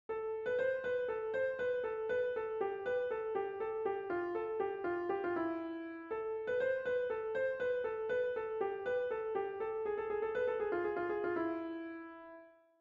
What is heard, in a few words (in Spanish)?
falseta